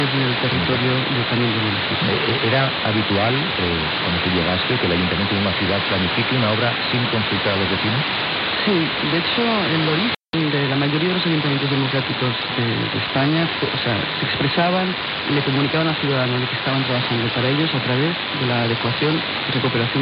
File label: 1521khz AMS; SER 5